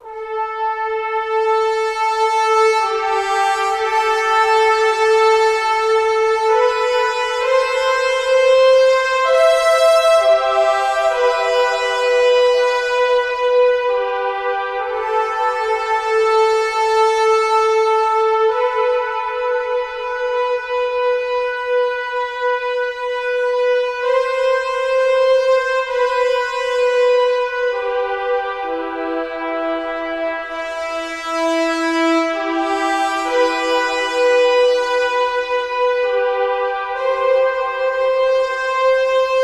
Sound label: Solo Brass Am 65Bpm; Construction Kits; Ghosthack; SkyRealm; Solace in Falkreath